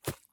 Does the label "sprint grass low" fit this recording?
Yes